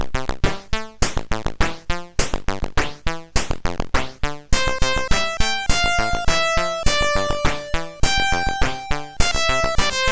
Super Synth Sample, sid MOS6581R3

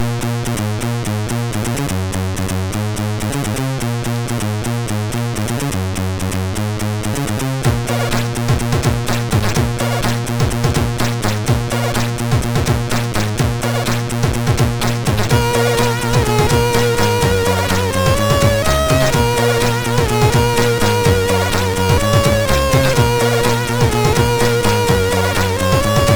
Disco Rap, MOS6581R3

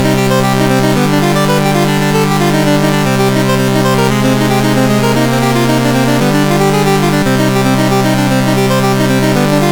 sid MOS6581R4; Halley Project

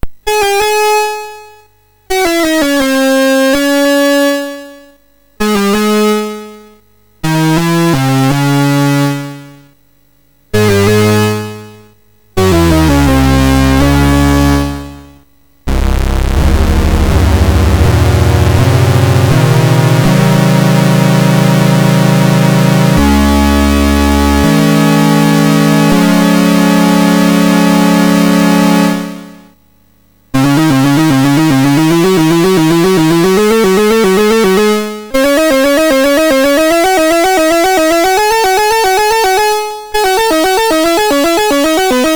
Dracula, MOS6581R4

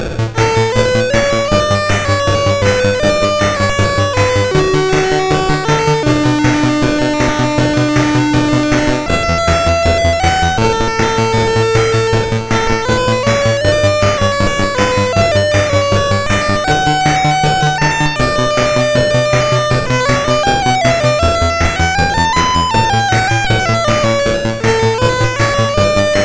Synth Sample III, CSG8580R5

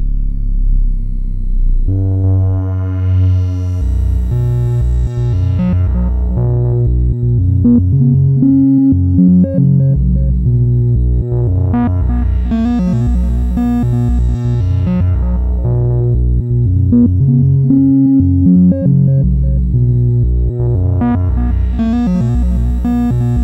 Page Beep, MOS6581R4

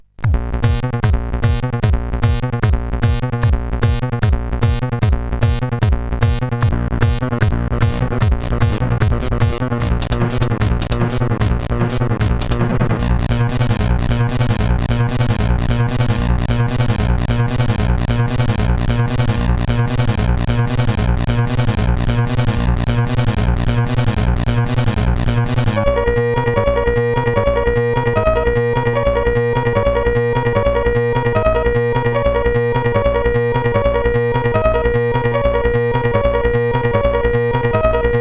Loop, sid CSG8580

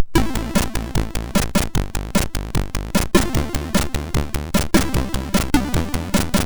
sid, MOS6581R2

